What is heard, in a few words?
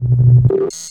decline; weapons; hl2; physcannon